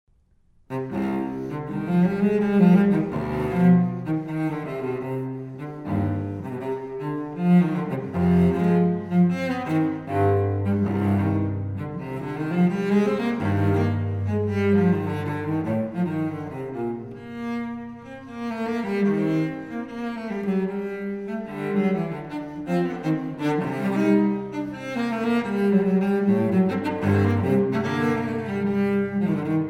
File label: Courante; Bach